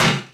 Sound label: Collision